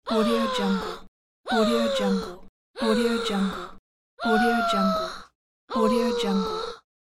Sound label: a female gasp